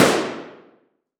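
Weapon Gun Shot Gun 12 Gauge Single Shot Interior Shooting Range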